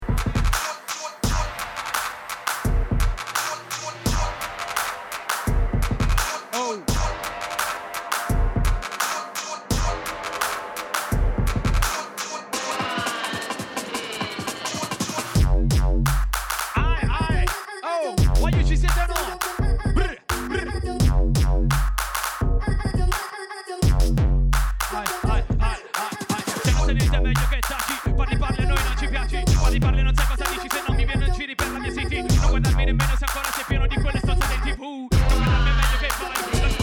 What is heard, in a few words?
Deep Dark Dubstep